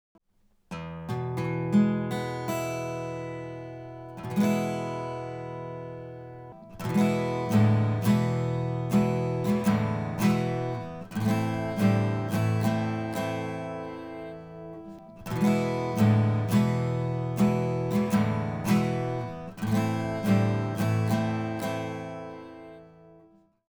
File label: Acoustic; Cinnamon Pepper